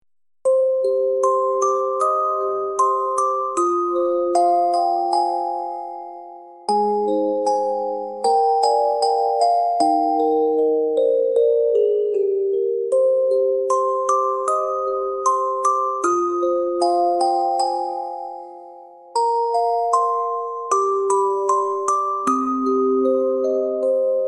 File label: dreamy night whispers